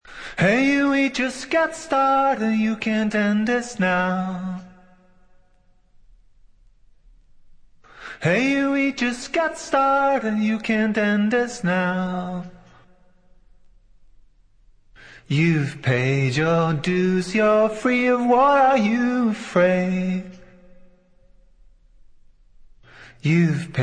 acapella; Funk

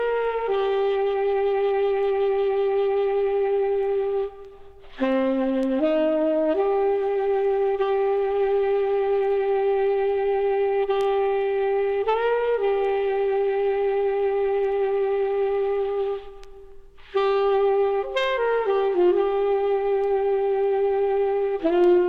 Blue Sax

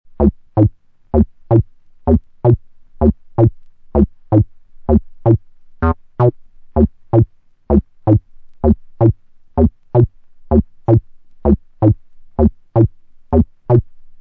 bassapella; house

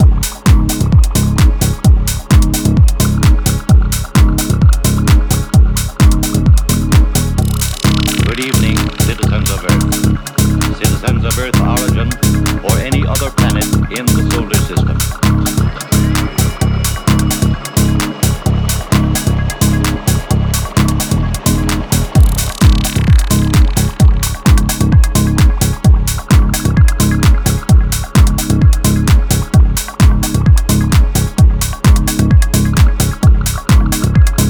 Static Signal

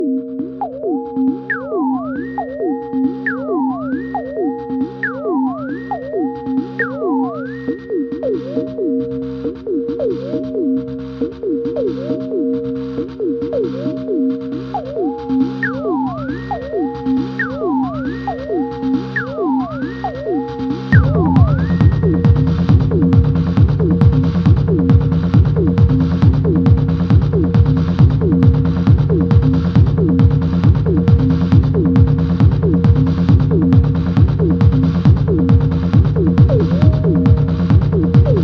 Static

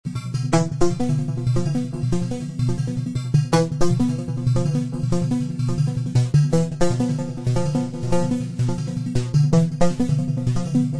frequency modulation

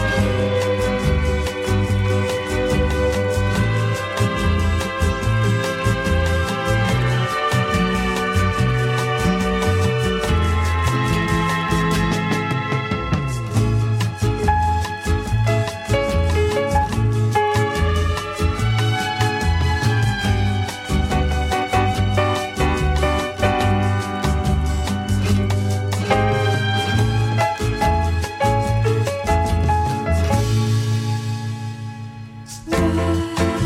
Hammond Bossa

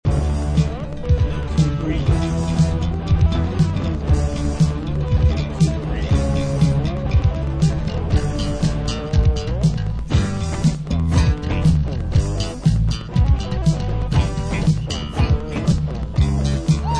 electric war (instrumental)

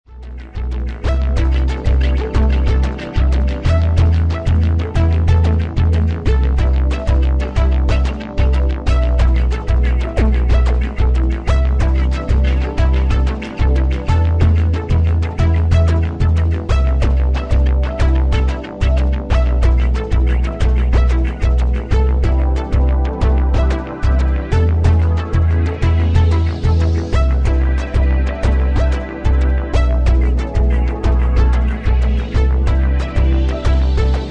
ambient version

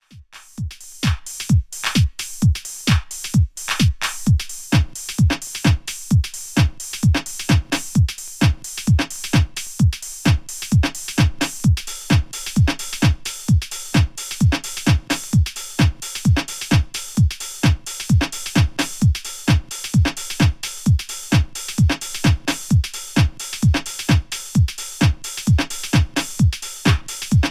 Wah Beats

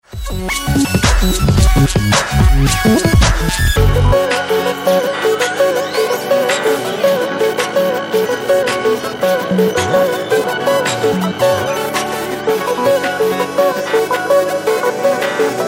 clap beep boom

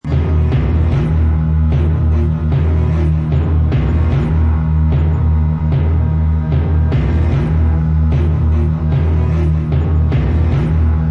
Cello

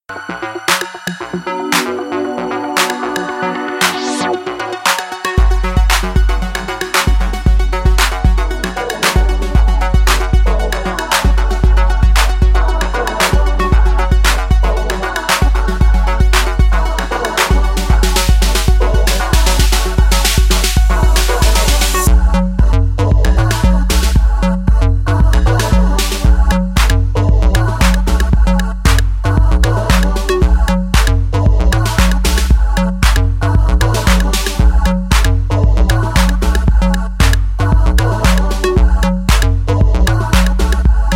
LFO Vocal Test

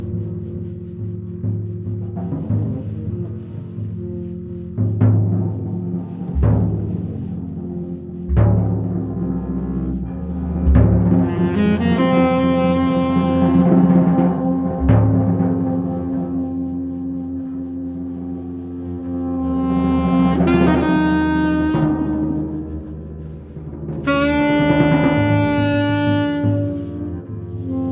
Drum Talk

